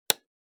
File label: Metal mechanical button